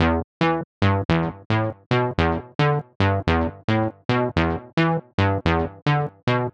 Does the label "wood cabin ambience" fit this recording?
No